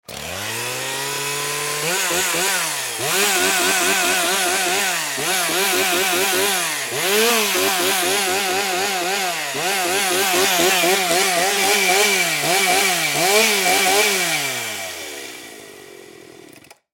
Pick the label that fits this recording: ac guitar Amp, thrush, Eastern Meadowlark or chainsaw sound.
chainsaw sound